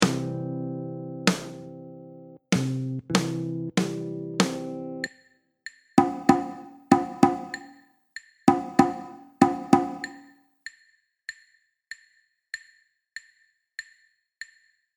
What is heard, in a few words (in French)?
rythme, guitare jazz